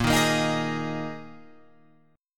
A sharp 7th Suspended 4th down Guitar Open F; strummed; JGuitar

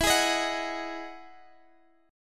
E Major 9th E, Guitar, Drop D; chord shape

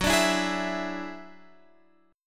F sharp 7th Suspended 2nd Sharp 5th; x,9,12,9,9,12; strummed

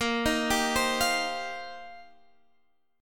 C Minor 11th; chord shape

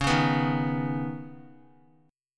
C sharp 7th Suspended 2nd, Guitar; strummed

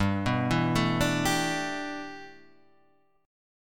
G 6th Add 9th, Guitar, Half Step Down

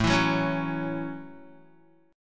A 6th A Guitar, chord; strummed

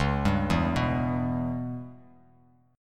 Db Minor 7th Flat 5th Db down Bass Standard; arpeggiated; JGuitar

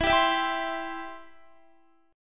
E-Minor Sharp 5th, Guitar; Em#5; strummed

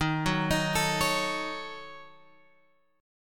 D sharp Minor 7th Flat 5th Guitar Open G; 13,11,13,14,14,x; chordshape

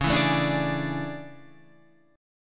A Major 11th, guitar

